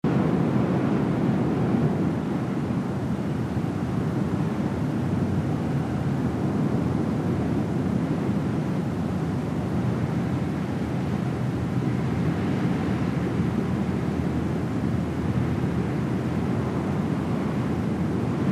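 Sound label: WIND Hallway Dark Muffled loop; gamerip; The Unfinished Swan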